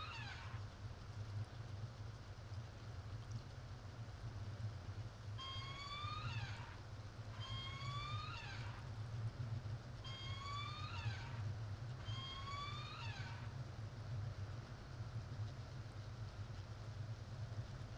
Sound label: kw playback